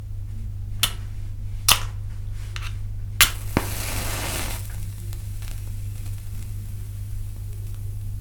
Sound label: match